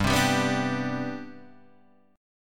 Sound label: C Major 7th Suspended 2nd, G, chord